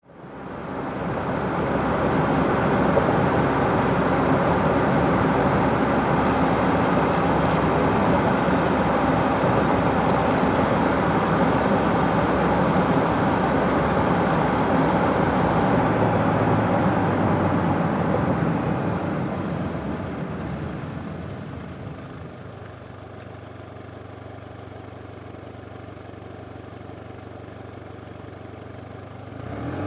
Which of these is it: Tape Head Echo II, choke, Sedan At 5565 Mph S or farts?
Sedan At 5565 Mph S